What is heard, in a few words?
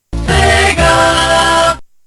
loading sound; sega